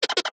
cartoon squeak short fast

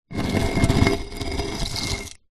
slab stone large drag on stone

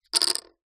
leisure poker chips x3 set down on wooden table

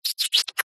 science fiction alien small creature vocalisation

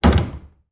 door dresser heavy wooden shut

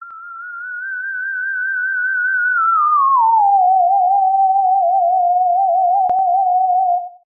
synth theremin robot wobble oldschool long; Jessey Drake

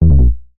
game tone error

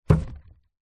bottle wine in paper gift bag set down; foley

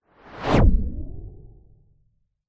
science fiction craft race past zoom ultra fast; zapsplat

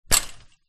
impact fence chainlinked hand

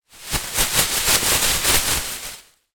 foley plastic bin trash liner bag shake; zapsplat

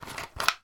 fizzy drink bottle cap remove; sound effects one

